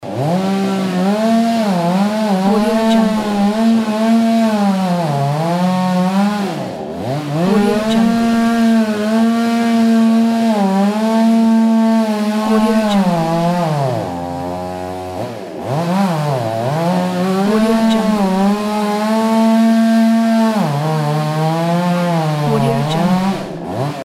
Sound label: chainsaw; soundfood